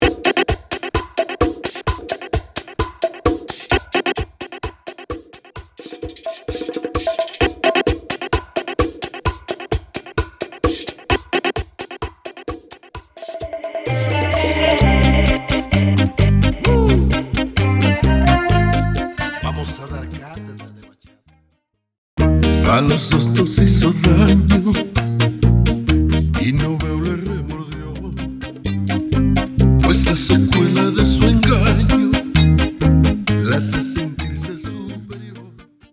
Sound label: Intro Outro Break 130 BPM